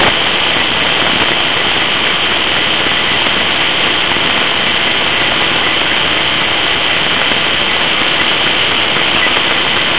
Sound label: sonic damage; player; source engine; hl1; video games